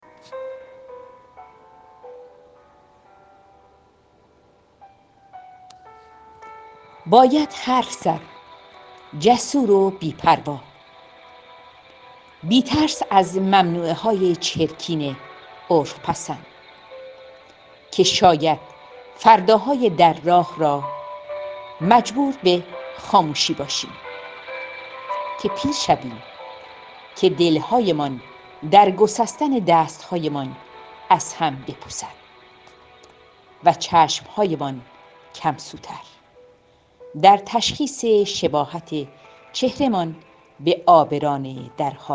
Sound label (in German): harfe